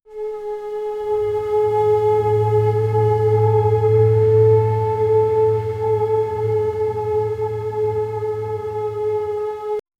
a mystical hum the rustli

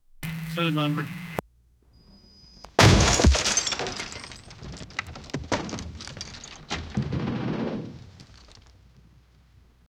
wood

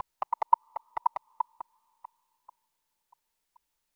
three step ascending elec

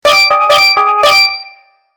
steal alert

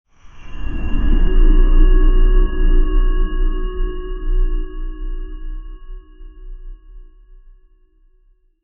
short game sound effect, metallic hit

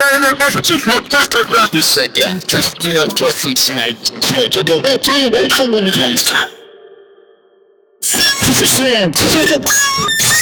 the sound of a comedy, thriller

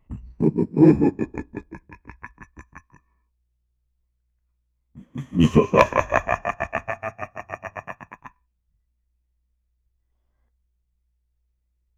evil deep laugh

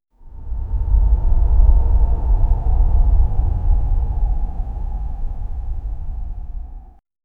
luxury ambient soundscape

